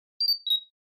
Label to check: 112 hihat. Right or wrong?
Wrong